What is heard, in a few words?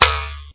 boing; monsterz